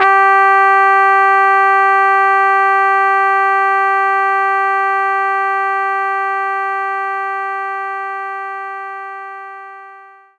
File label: trumpet G4